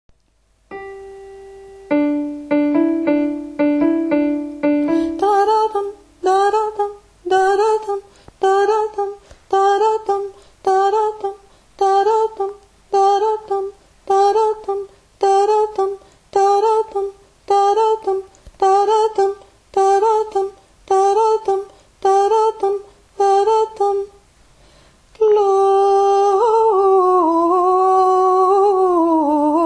voix alto

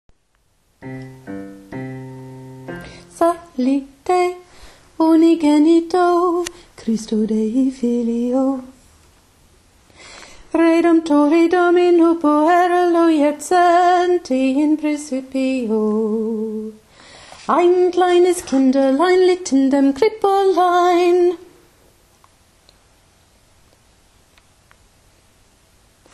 psallite alto plus vite

